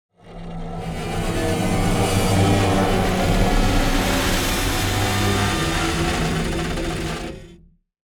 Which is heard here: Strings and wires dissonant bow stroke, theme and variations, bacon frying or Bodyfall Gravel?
Strings and wires dissonant bow stroke